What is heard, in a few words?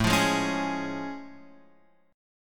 A Augmented Major 7th A Guitar Open F; strummed